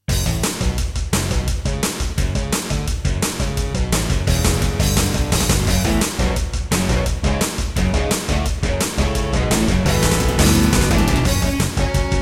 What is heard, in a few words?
Intro, NEC XR385 (DB60XG Clone) GS MODE; Duke Nukem 3D